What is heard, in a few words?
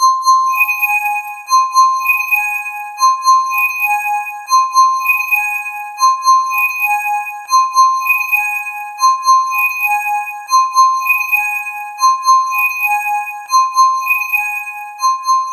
SFX - UI Connecting to Wi-Fi; Mario Kart 8